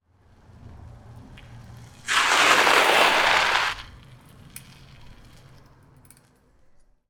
Bicycle, Skids, Gravel, Front, Prespective; RZ Post; The Bicycles SFX

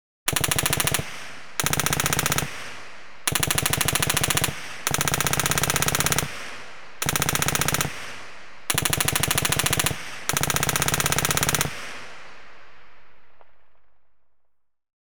Warfare, General Purpose Machine Gun; BBC Sound Effects Library; Explosions Guns Alarms

